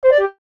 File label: Notice Light; Buttons; Multimedia; Blastwave